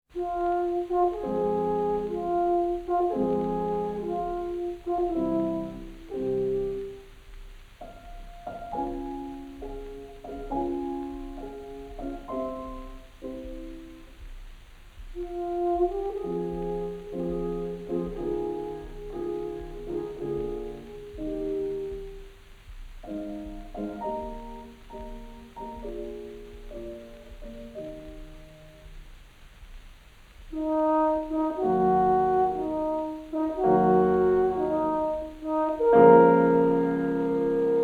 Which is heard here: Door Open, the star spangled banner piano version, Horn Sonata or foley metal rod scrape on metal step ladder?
Horn Sonata